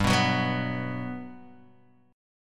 G Major 7th, G, down, Guitar, Open D

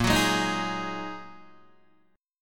Bb 7th Suspended 4th Sharp 5th Bb Guitar Open D

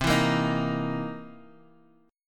C 7th Sharp 9th, Guitar, Half Step Down; chord

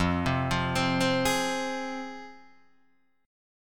F Minor, Guitar Open D

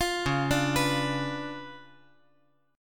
C sharp 7th Flat 9th, chord; C#7b9; arpeggiated; JGuitar

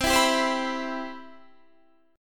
C Major 7th C

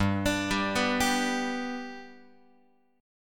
G Major down Guitar; G arpeggiated; JGuitar

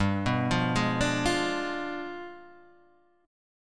G 7th Flat 9th, Guitar, Half Step Down

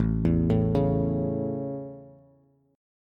A Major, Bass; arpeggiated

